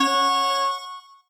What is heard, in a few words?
Starting Sound; multiplayer kart racing; boom karts; android